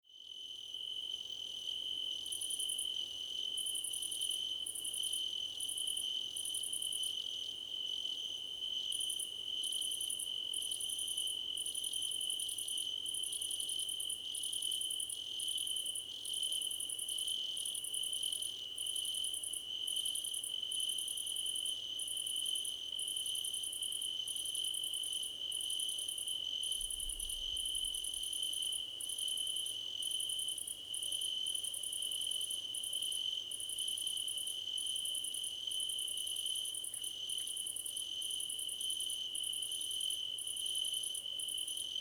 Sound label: crickets choir